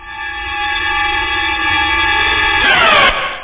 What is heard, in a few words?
BIG ENTR, PEOPLE; Easy Personal Web Page